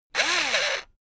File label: electric drill screw in short; maschinen; bohrer; soundpool